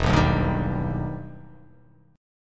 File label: E Minor Major 7th E down